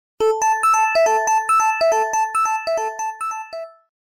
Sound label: Glass bell tone